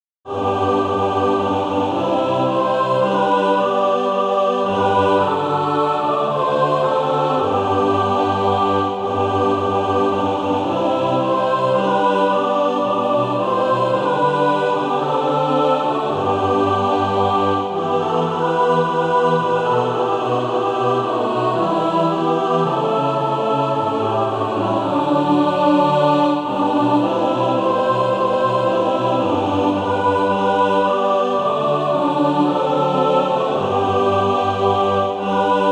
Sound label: mixed